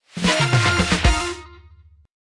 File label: End Run Screen Crew Bonus; Subway Surfers City Sound Effects; Android